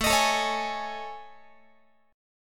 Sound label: A Major Sharp 11th, Guitar; AM#11 strummed